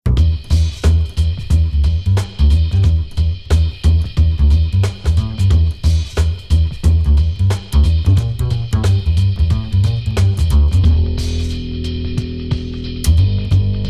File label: Acoustic Walkoustic Swingin; Trilogy